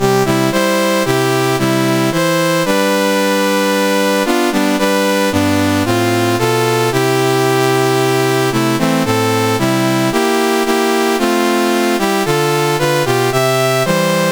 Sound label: Botswana National Anthems, CSG8580R5